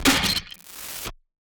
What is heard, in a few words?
Distorted Snare, Dubstep